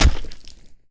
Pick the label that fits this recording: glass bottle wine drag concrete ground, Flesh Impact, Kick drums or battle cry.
Flesh Impact